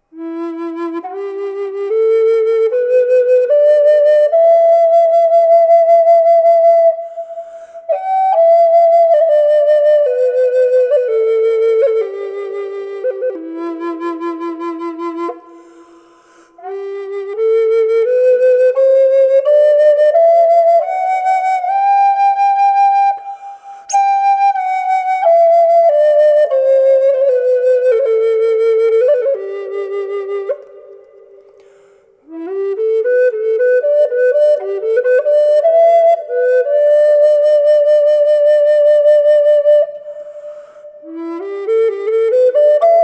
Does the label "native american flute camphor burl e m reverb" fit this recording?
Yes